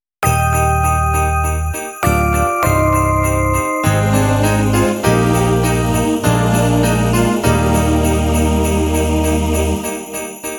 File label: karaoke